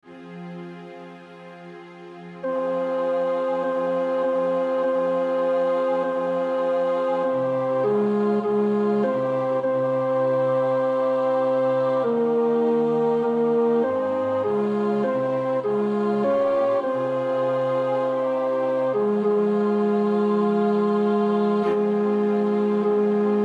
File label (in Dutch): tenor, koorpartij oefening; Franck (César); Les sept paroles du Christ en Croix; Parole 4